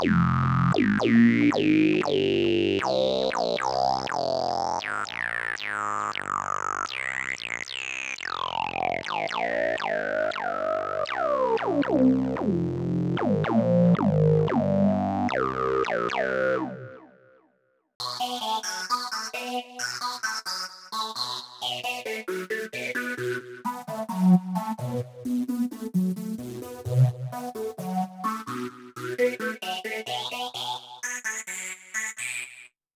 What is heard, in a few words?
bass then lead vocal filter; Clavia Nord Wave